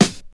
old school hip hop snare sound g key; snare drum samples

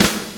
clean acoustic snare f key; snare drum samples

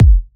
fluffy kick sound g key

